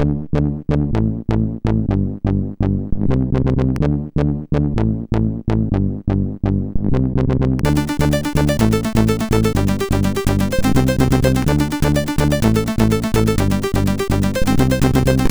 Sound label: Techy Tech MOS6581R4